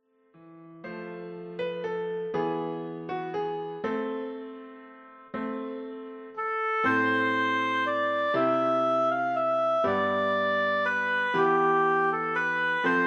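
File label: Greensleeves, Oboe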